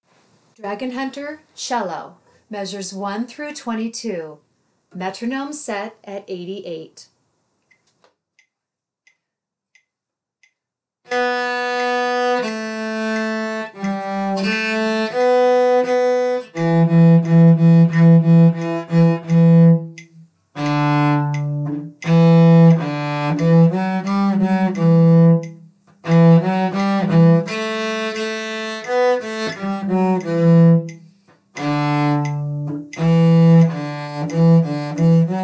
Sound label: cello